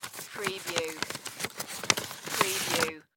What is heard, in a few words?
Envelope Letter Remove; Sound Effects Bible